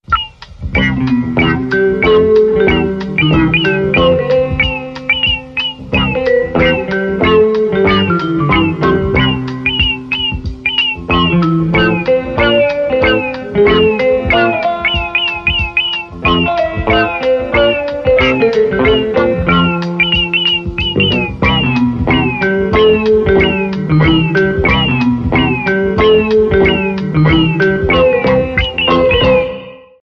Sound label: Hoppity Hip Boing